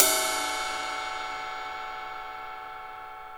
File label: 18INCH ZIL RIDE, Ride Cymbal